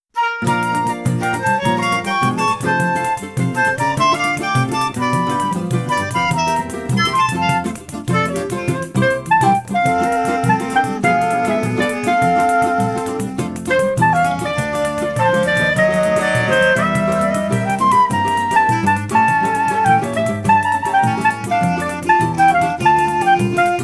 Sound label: songbook instrumental